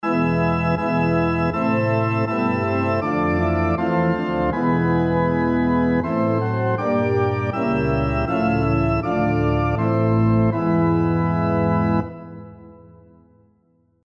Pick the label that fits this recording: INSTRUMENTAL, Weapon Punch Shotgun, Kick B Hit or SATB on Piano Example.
SATB on Piano Example